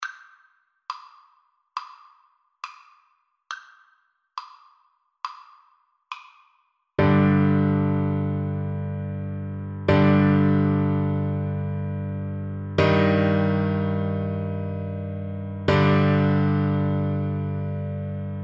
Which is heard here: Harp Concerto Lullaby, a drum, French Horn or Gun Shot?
French Horn